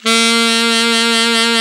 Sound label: ALT SAX, D3; Best Service; Alpine Volksmusik